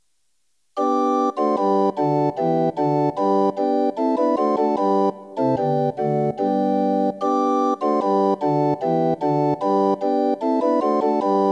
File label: Hymne, Christmas Bells; Hear! The Christmas Bells